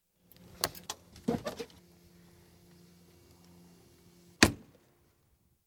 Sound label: Cessna C150, exterior, door opened & closed (Light aircraft, single piston engine); Airplane Sound Effects; BBC